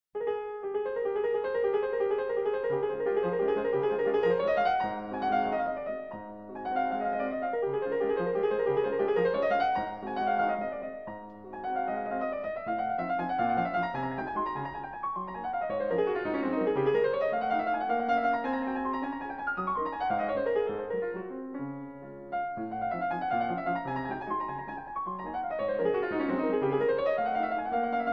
Waltz No. 6 in D flat Major Minute Waltz Op. 64

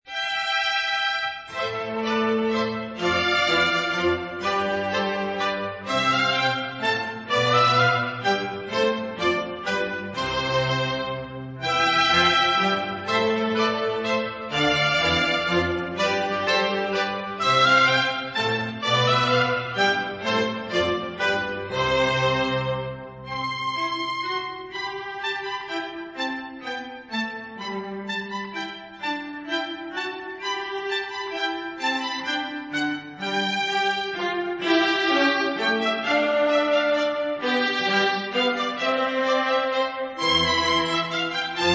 Tempo di minuetto

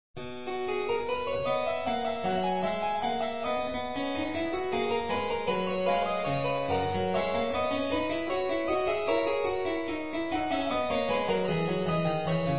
Sinfonia No. 1 in C Major BWV 787

